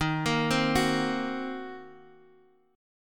D sharp Minor 6th D sharp down Guitar Half Step Down